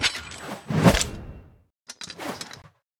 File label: atk whoosh